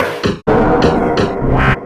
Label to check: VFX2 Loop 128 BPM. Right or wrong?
Right